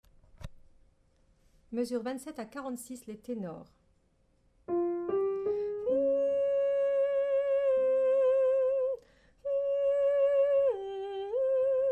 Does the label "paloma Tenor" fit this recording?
Yes